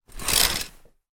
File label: household curtains draw hotel